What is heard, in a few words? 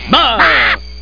PAIN